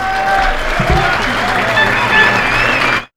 CROWD; E-Lab; X Static Goldmine 1

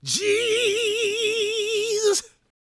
GOSP MALE, Vocal Planet Gospel

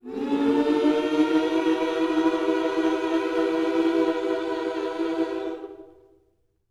MM MINOR, Vocal Gospel; Spectrasonics; Vocal Planet Vol.1 Gospel

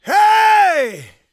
shouts, gospel preacher

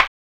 RIM; E-Lab; X Static Goldmine 1

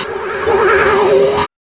SYN-FX

